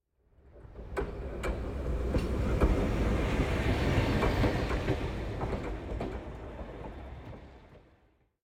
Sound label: Train Low Speed Passing European Train Type - L to R; Hollywood Edge; sound designer's toolkit v2